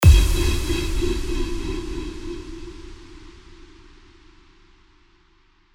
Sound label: Impact